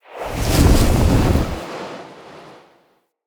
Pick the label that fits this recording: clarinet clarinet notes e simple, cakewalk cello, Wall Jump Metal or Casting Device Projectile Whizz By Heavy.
Casting Device Projectile Whizz By Heavy